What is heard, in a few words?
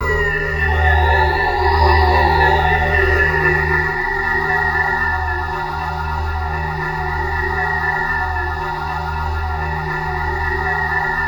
tension, ambient atmospheres